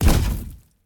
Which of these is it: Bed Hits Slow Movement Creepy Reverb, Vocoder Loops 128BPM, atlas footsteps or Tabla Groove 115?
atlas footsteps